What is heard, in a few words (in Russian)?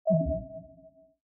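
звуки операционной системы mac os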